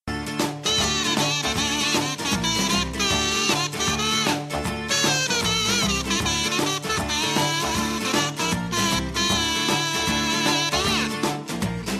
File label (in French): musique